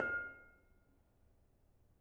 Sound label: Bonang Panerus Pl 3, gamelan; gravityharps; timbrebox